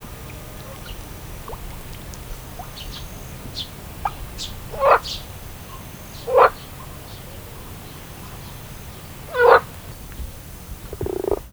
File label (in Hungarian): a végén kurrog, tavi béka; Poroszló; termeszetben